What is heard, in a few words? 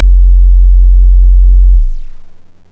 SH101 SUB BASS; Urban Shakedown